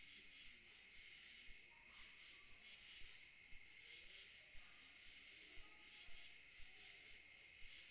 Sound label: whistle